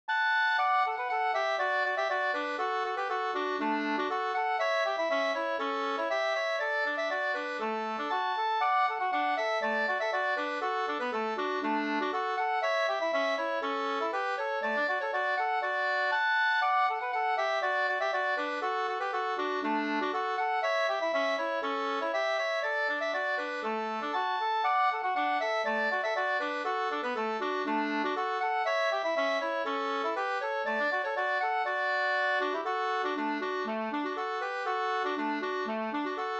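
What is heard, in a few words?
polka polkett D major; e Kwarnsjwi-Helmer Vb Ddur 2st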